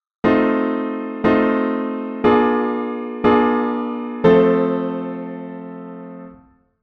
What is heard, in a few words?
plagal cadence IV 16; 16 edo theory; 16-ET